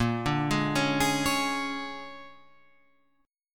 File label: A sharp 7th Sharp 9th A sharp down Guitar Open F; A#7#9 arpeggiated; JGuitar